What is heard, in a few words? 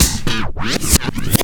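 REVERSE LOOP; Big Beat Samples & More; AKAI; ALL LOOP KIT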